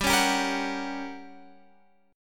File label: G Minor Major 7th Flat 5th, G, down